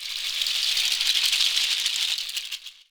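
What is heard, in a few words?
goat toe, African shaker; Voice Crystal - Steve Reid Definitive Percussion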